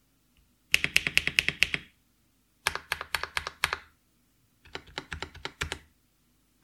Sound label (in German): Custom Alles; SGK50 S3